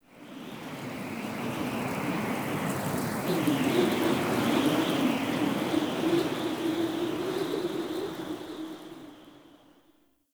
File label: Under City Wind Gust Strong Whistle Rope